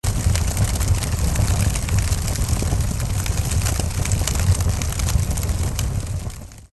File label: Bonfire